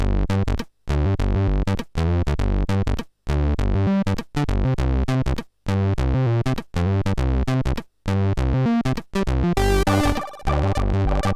sid MOS6581R3

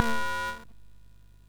sid MOS 6581R2

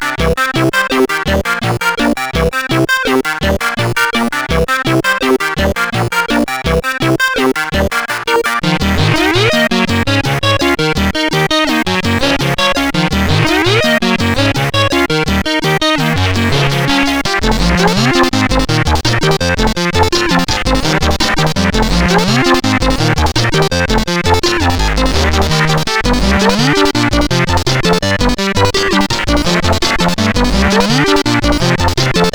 Space Adventurer Danger, CSG8580R5; sid

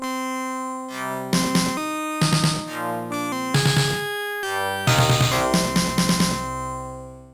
short defeat, Sonix Music Driver

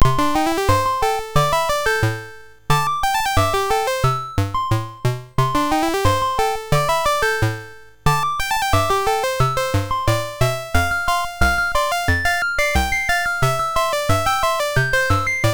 sid MOS 6581R3; GeeBee Air Rally

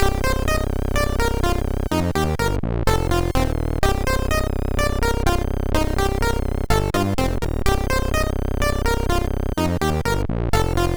Game Over, MOS6581; SID; MOS6581R3

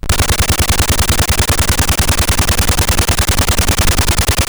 sid CSG8580R5; Fiendish Freddys Big Top O Fun

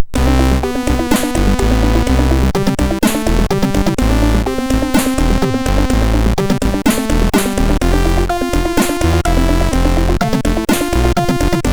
Happy Birthday, MOS6581R2; sid

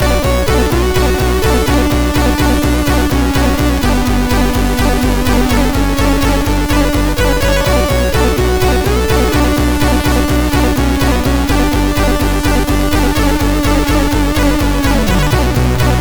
sid MOS6581R3

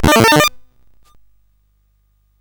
sid CSG8580R5; Gremlins 2-The New Batch

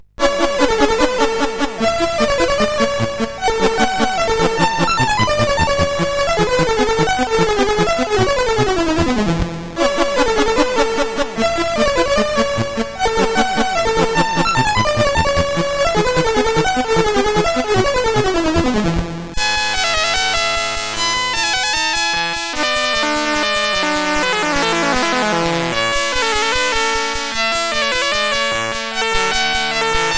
Sonatina CSG8580R5; sid

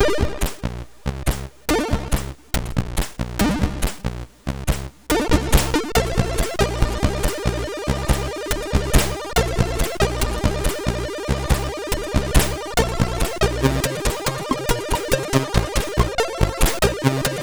1988, MOS6581R2; sid

